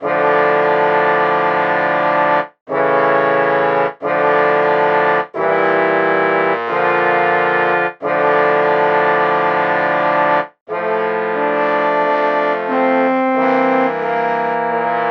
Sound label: Trombone; Slipherette; A Trombone Quartet in the Diminished 5th chord sequence